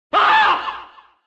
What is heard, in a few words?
enemy scream; pizza tower